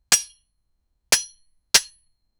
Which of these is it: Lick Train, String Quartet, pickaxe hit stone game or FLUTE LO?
pickaxe hit stone game